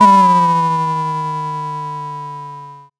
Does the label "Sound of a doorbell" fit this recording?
Yes